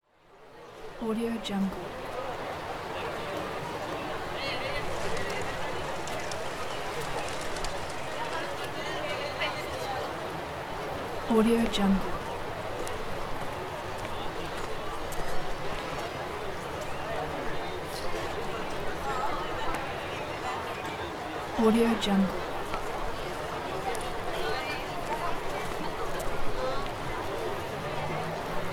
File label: city square ambience